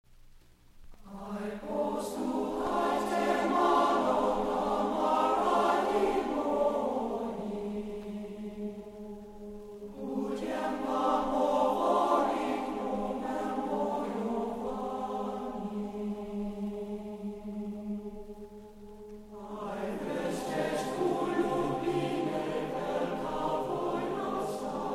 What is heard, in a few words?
Slovak folk songs, glee club; Five Slovak Folk Songs